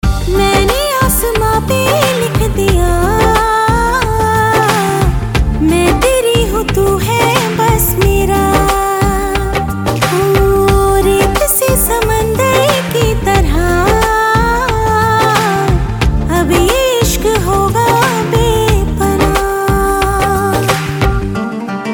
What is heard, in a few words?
Female Love Song Ringtone